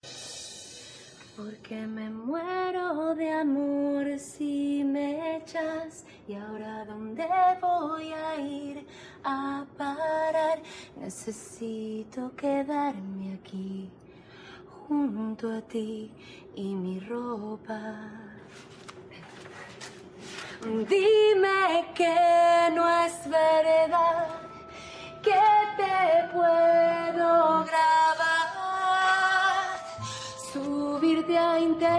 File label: acapella